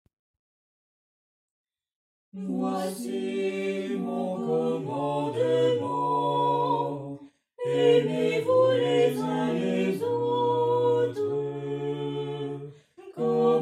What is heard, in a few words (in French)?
4 voix